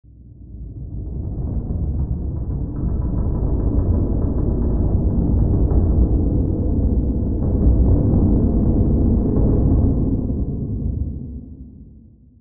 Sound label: Earthquake designed deep rumble vibrations